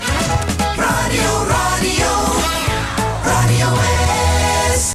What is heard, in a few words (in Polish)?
Jingiel Radio